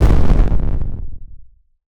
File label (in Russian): 808 ветер; drumkits; fl studio; goodtakimu drumkit